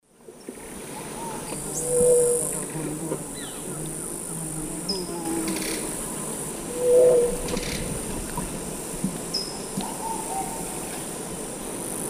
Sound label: Leptotila rufaxilla, song; S. America; Eastern Lowlands